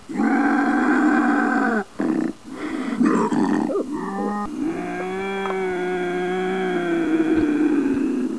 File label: bobcat; worldofcats